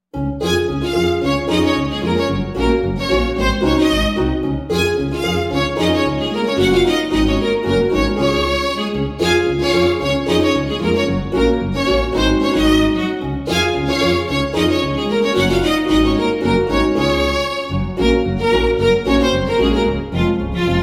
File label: Violin; Undertale